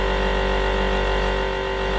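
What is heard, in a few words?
cabin hum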